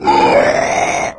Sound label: stalker alert; npc; source engine; episodic